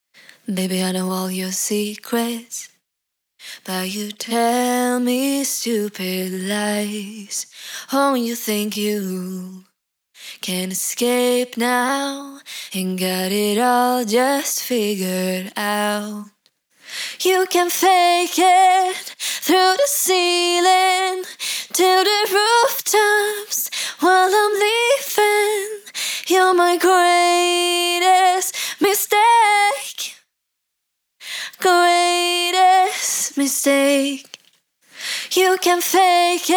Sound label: VOCAL LEAD DRY